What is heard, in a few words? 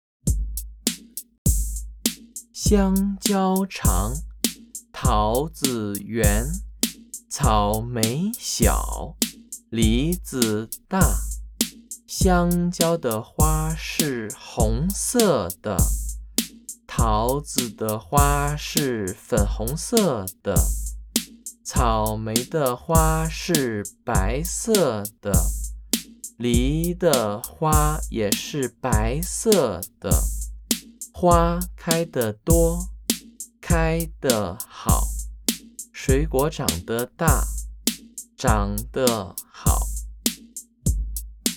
Chant